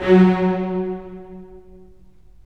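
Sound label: STR, strings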